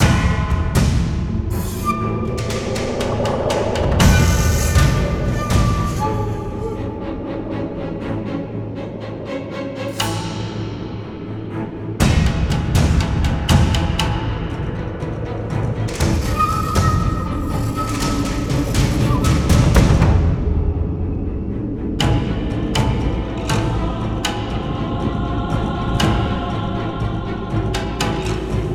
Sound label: Battle Boss BGM; Castle Morihisa